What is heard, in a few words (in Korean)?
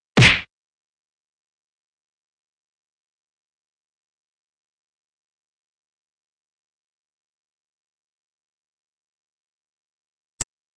Fights, 싸움-전투; 헐리웃특수효과음